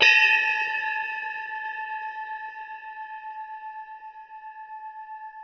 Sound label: Finger Cym Op